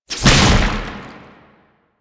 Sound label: Tank Infested Charge Tentacle Whip; Enemies; Abilities; Warframe; Lasrian